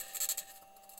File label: mic, mars2020 edlcam